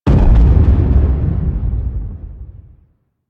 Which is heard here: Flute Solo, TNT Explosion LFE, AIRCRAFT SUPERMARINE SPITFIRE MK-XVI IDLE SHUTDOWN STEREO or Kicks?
TNT Explosion LFE